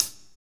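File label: hi-hat, Techno Rock; Northstar; Drumscapes Roland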